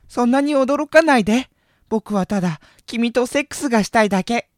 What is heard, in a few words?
fairy